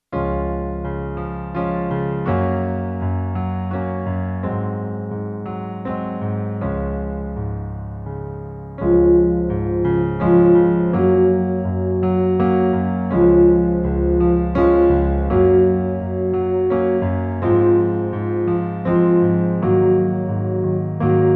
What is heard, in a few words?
Swaying in Eb